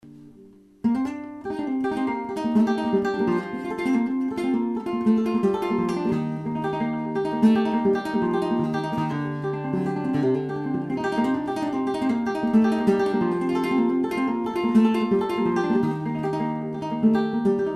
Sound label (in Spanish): granaina